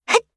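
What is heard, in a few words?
attack, Voice Line, Korean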